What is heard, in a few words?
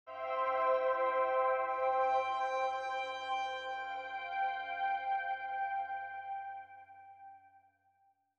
ambient